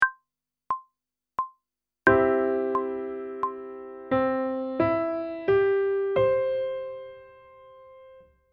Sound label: Step 2 Major Arpeggio one octave ascending; pop vocals